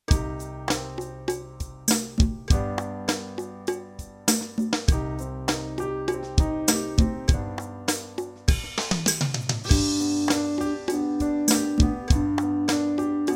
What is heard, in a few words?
Eb low, practice